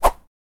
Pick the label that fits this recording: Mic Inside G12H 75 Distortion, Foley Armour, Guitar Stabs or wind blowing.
wind blowing